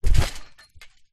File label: sport trampoline bounce jump land bum close up underneath; zapsplat